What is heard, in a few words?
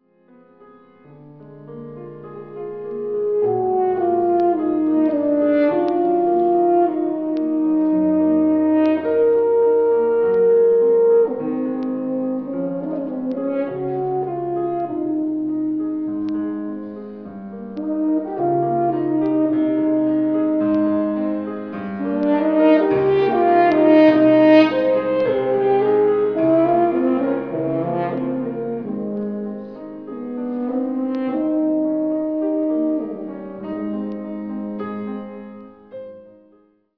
horn